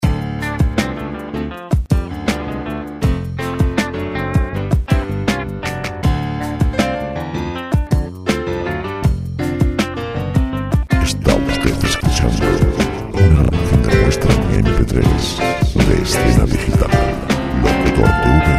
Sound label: jazz